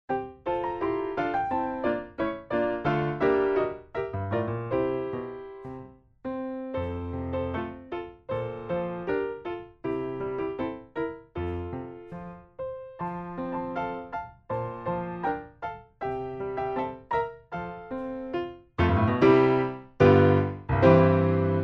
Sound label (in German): Playback Klavier; Playbacks; Das Stille Wasser; Koessler